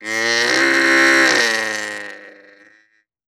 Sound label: Bronx Cheer, Comedy Vocals; Vintage Cartoon